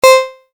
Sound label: Mandolin